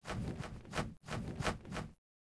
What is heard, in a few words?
run; poolofradiance